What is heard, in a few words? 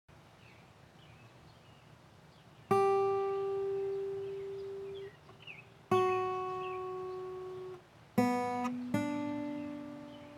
Guitar Attempts